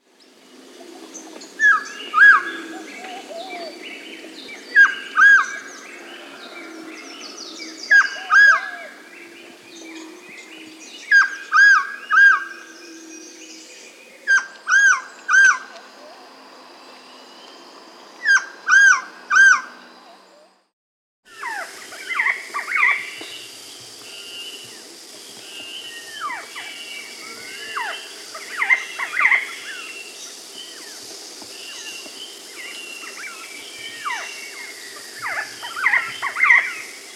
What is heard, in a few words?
Crested Quetzal (Pharomachrus Antisianus), Andean Birds; S. America